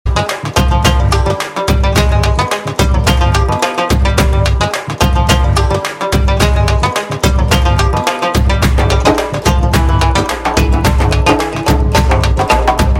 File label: Kandasamy Beat